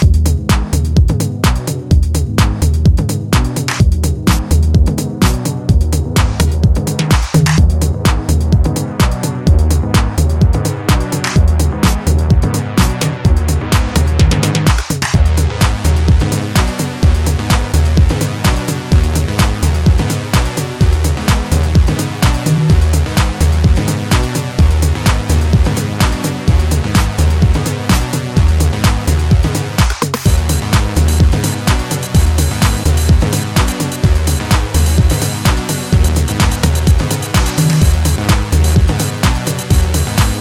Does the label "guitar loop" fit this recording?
Yes